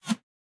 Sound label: Chop; Caffiend